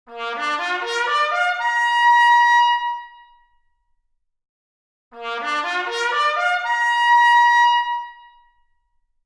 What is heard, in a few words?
Trumpet arp, Stereo input